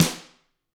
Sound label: GRETSCH SNR, Double Platinum Drums